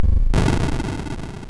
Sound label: Die Alien Slime, MOS6581R2